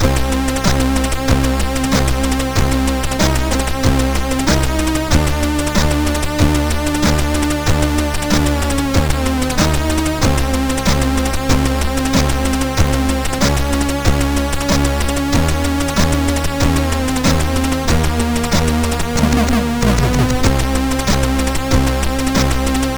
Horror, MOS6581R4

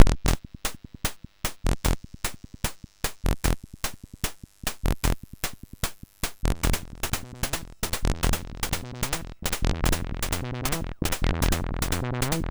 303 Emulator, MOS6581R3; C64

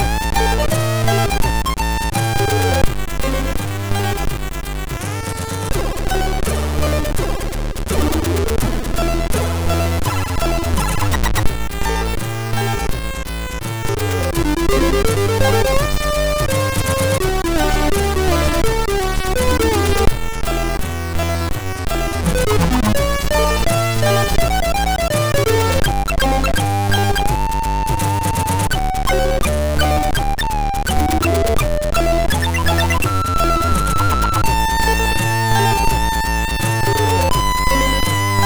Guitar Work, MOS6581R3

